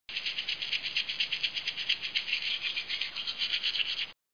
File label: tooth brush